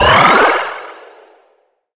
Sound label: Power Up; Pac-Man Championship Edition 2 Plus